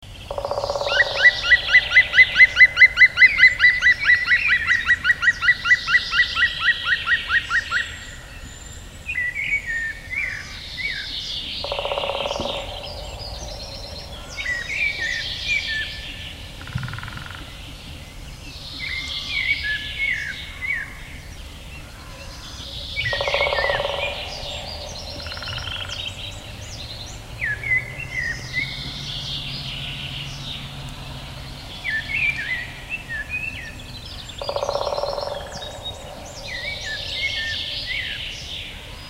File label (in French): pic noir Dryocopus martius; balade a l'ecoute des oiseaux